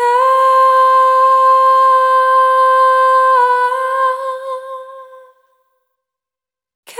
Single Voice Melodic Loops, Vocal Samples

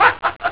hahaha; Amiga Soundtracker sample

